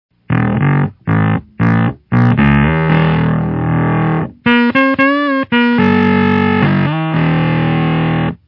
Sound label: bass ring